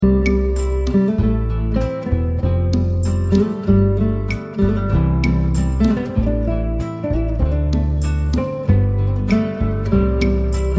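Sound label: guitar instrumental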